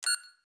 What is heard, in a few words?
Cancel; Star Trek